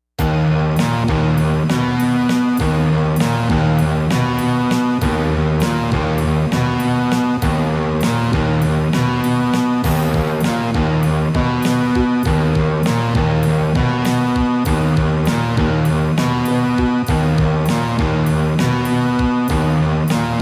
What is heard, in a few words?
Roland SC-8850; Doom 2; Doom 2 Revisited